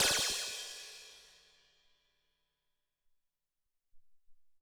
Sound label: FX Crash, Alesis SR-18